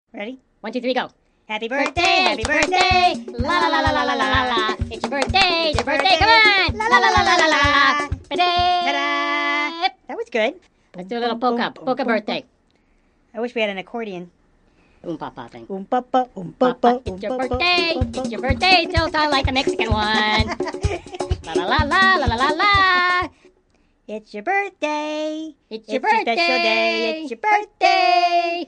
Happy Birthday lalala kids singing

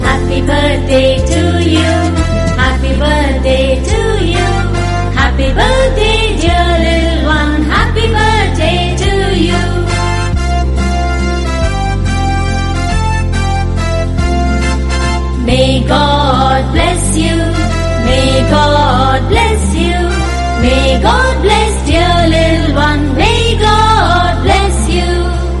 Happy Birthday Song For Little Girl

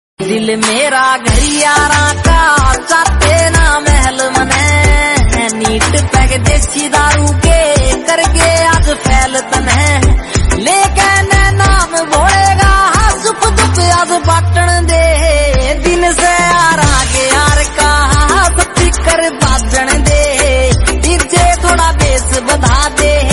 Happy Birthday Remix Haryanvi

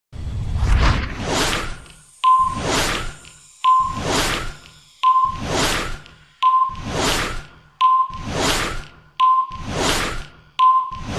happy birthday song for School Friends

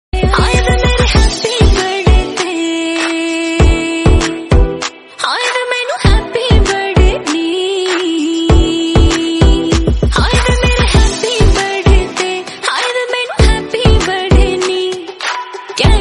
Birthday Song Hindi

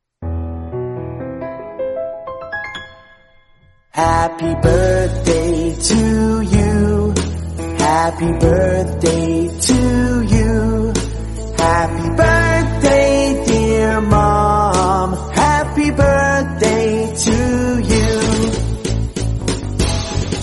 Birthday Song For Mom English Song Bgm; Song Duration:-35 Sec

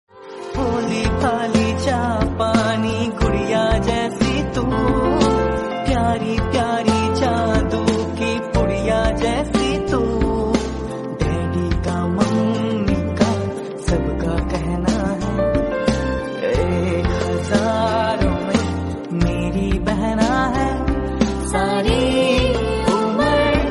sister birthday song

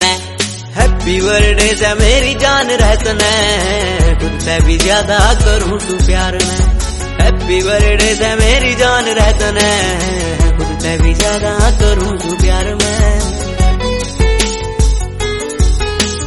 Happy Birthday Haryanvi Song

